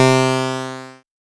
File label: lead C3, 5U Modular; Polyend; Simulacrum Sounds; 1 Shots; Synths; Leads and Keys